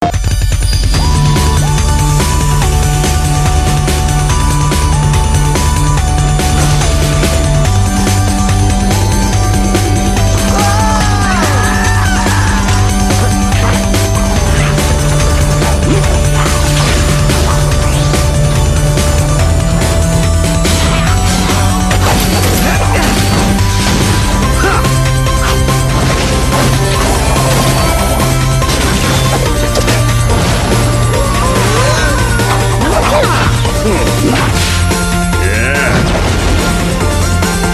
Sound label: trailer music